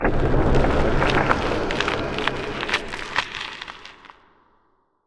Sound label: frozen planet; ambient; Stellaris